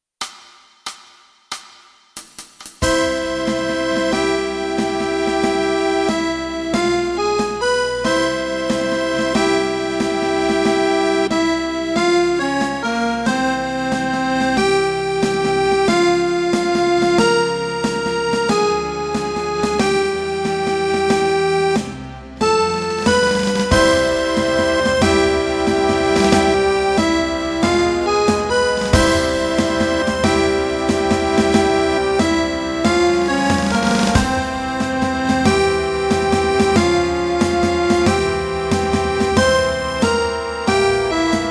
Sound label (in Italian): Fisa tutti